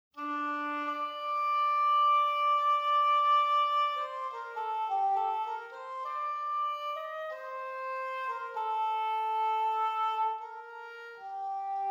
corno inglese

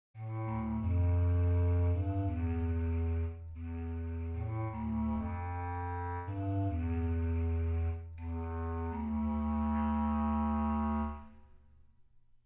clarinetto basso

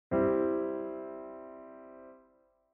accordo do maggiore; Comporre musica da film: il genere Horror e Thriller

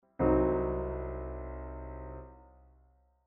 accordo do diminuito

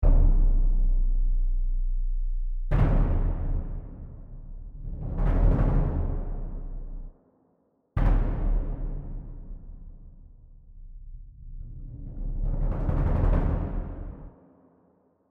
percussioni bassdrum; wagner

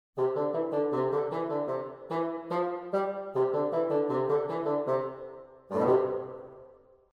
Fagotto

